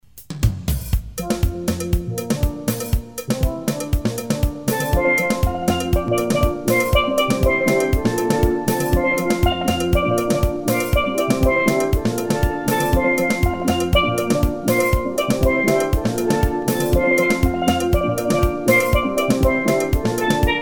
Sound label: steel vibrations